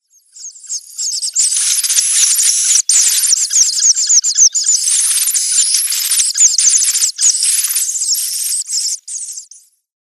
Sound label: Eurasian Hoopoe downy Young